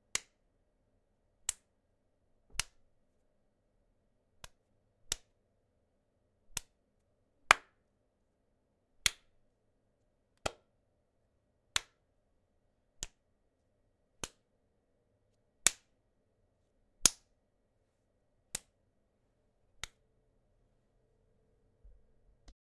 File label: Slapping